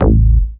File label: attack, BASS, Techno Trance